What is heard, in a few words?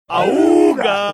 ahoooga